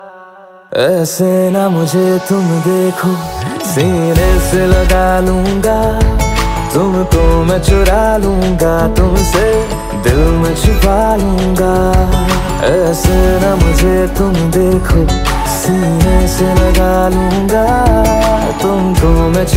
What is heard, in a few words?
Romantic Ringtone